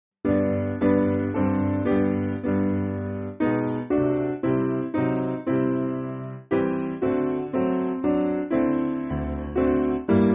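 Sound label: Piano, Backing Files for Churches; Camp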